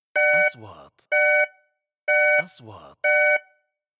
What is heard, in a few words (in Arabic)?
صفارة إنذار; transitions